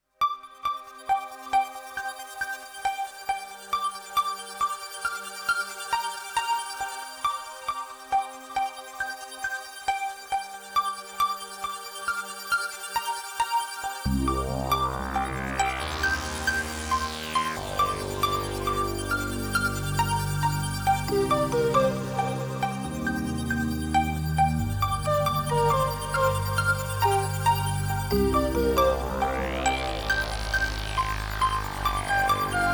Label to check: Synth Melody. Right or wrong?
Right